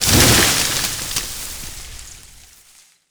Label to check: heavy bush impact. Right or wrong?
Right